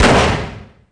gun

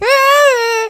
get hurt

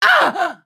hit

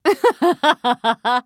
laugh

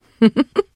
trader giggle; 商人杰恩（七日杀）

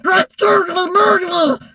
hurt vo; toon cordelius; 纸片人科迪琉斯; 卡通工作室

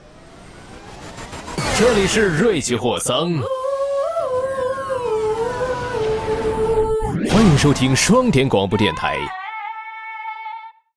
Mandarin Sting; 开场白

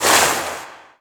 Dolphin jump; RA3

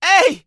hurt

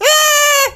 get hurt

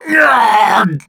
hit; Oloch

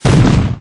royal giant fire

